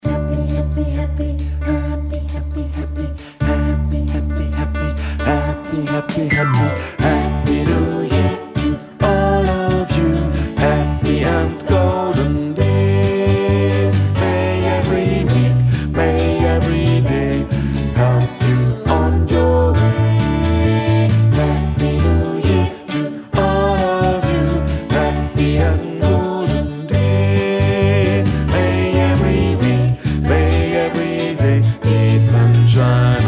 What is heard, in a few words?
Bass